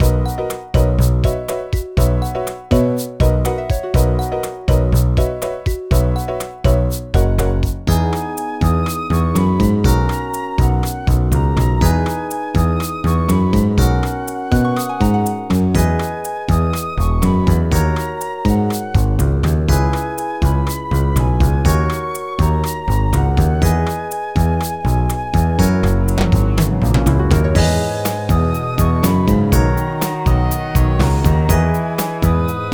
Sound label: BGM Staff Roll; Shin Noukyo Iku